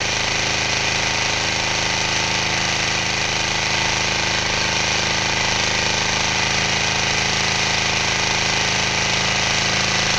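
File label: Motor Small Gas Slow, motorboats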